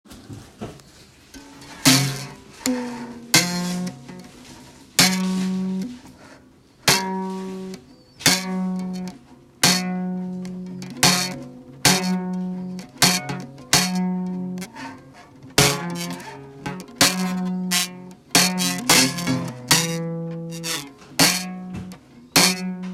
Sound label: Guitar